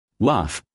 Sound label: laugh